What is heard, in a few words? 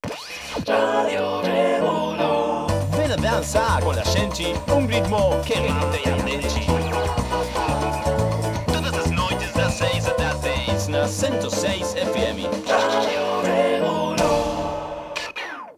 Radio Car Brazil Jingle; gangstar rio city of saints